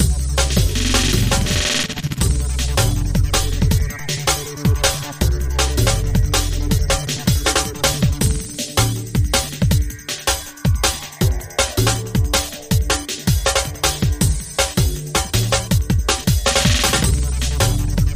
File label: Drum bass thirty